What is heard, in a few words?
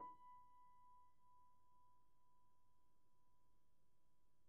piano pp, steinway